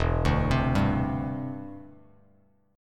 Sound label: E Minor 7th Sharp 5th, down, Bass Standard; arpeggiated